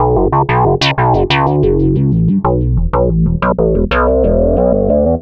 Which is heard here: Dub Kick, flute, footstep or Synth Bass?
Synth Bass